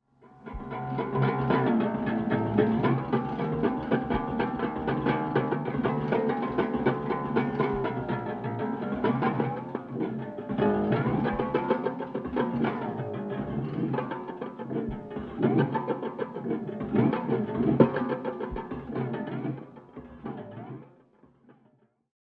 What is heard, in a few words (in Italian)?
gorgogliatore; russolo luigi